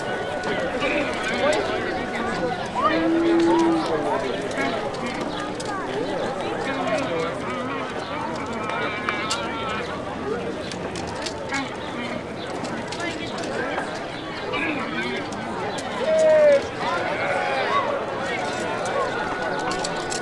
ambient trade, building

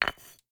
construction stone

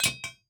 mine metal

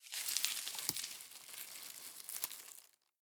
leaves, foraging

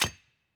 mine stone

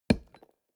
lumber tree

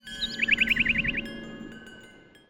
Temple; 0AD

